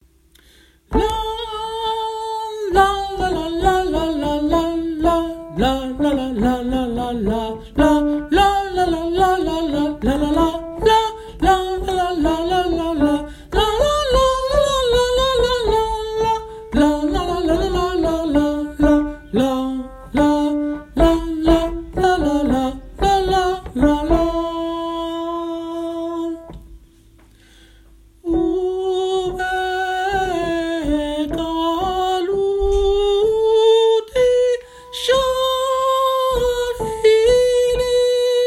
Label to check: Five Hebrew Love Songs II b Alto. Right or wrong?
Right